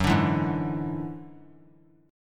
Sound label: F sharp 7th Suspended 2nd Sharp 5th; F#7sus2#5 strummed